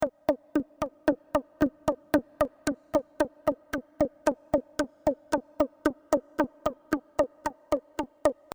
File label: loop